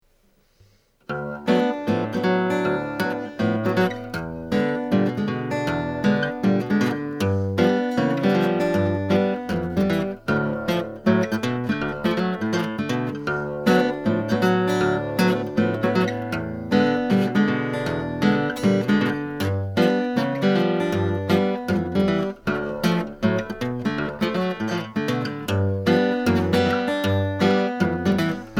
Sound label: rythm